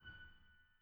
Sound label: harm F#6, Grand Piano; bitKlavier Grand; Piano Bar